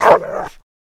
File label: Rottweiler Vicious